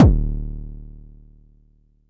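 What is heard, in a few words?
Long bass drum, Jomox Airbase99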